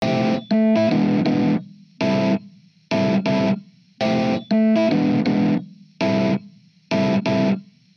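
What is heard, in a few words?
amp epiano, Hybrid neural guitar overdrive; souldriven; Morphdrive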